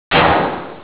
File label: shut